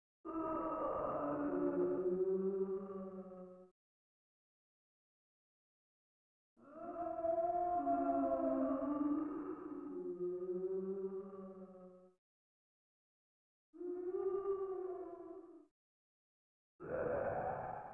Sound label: zombies; hallow